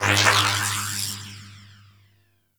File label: Shield Electricity; Warframe; Volt